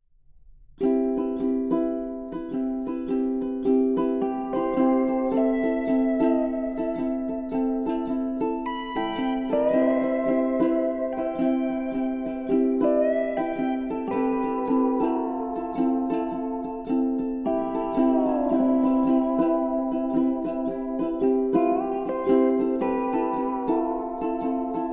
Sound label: Hawaiian Cowboy Instrumental